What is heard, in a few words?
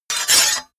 sword cut hard scrape short